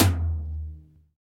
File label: big tom single hit d sharp key, tom single hit tuned to the D# note; tom drum